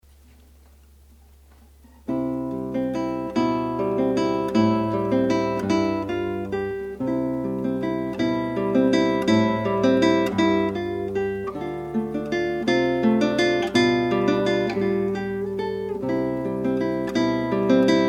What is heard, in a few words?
Musette